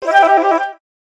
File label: flute